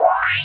E stab; Loopmasters; Funky Underground Vol 2

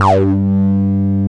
303 G2; Jack Sparrow; Deep Dubstep Progressions

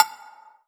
Percussive; Mutekki Media; Vengeance Electroshock Vol.2; VES2 Percussion

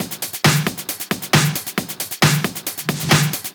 135 DRUMLOOP; Loopmasters; Booty Breaks Vol.2

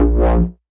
Bass Shot A#; Mutekki Media; Vengeance Electroshock Vol.2; Bass Shots

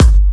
Bassdrum

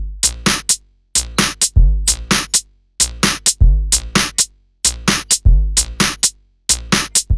Fidget, 130 BPM breaks loop; loops; Loopmasters; Jon Carter & Alex Blanco; Block Party Essentials

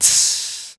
Human Beatbox, Beatbox Hits; Vocal Hits; CYM; Loopmasters

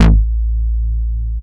Bass Shot A; Mutekki Media; Vengeance Electroshock Vol.2; Bass Shots

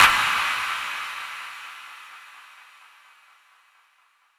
FX Impact

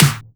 Claps & Snares

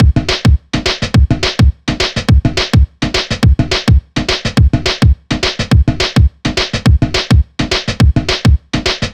105 Breaks; Juice Lite

